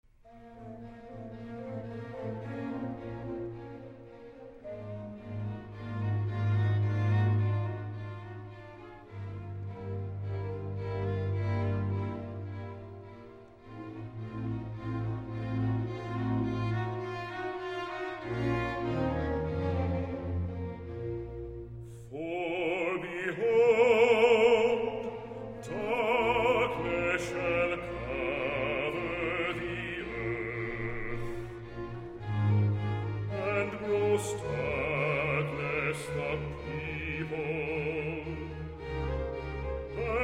For behold, darkness shall cover the earth - Bass Recitative; Boston Baroque; Handel Messiah